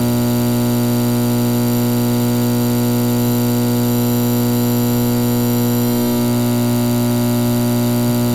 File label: light fluorescent hum